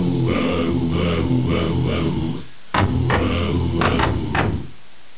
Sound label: DESERT